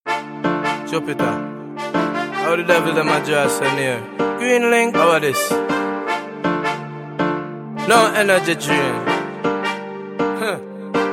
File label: riddim